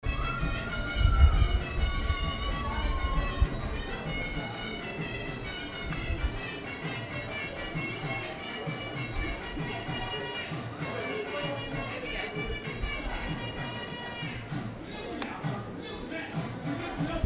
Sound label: singapore horns